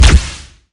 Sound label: shoot, plasma rifle; weapons